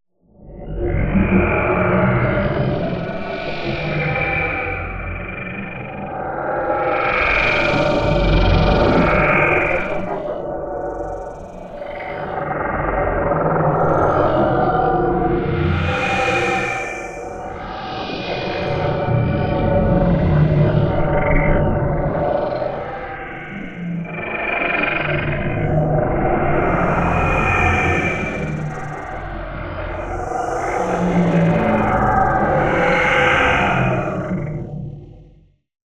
MOVEMENT Granular Spiral; NEON; boom library

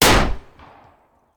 Hand Gun; Sound Ideas; Guns

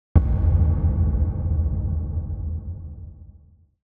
Impact Drum Dull Low Wooden Reverberant Hit; Hollywood Edge; sound designer's toolkit v2

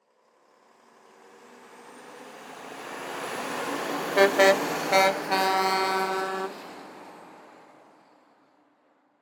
Semi Truck Mac; Large Vehicles; Blastwave

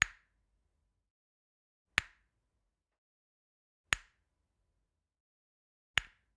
Generic Button Glass Tiny; boom library; The Interface

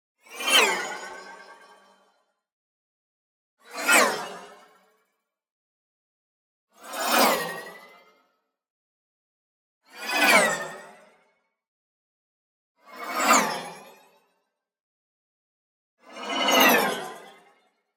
NEON WHOOSH SMALL FAST Fluorescent Dopplers; boom library

